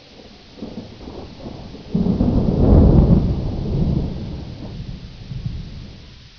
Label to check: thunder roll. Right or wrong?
Right